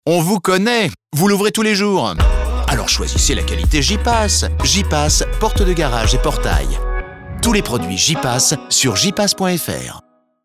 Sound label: SPOT MUSIQUE